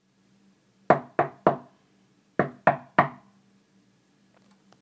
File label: Door Knock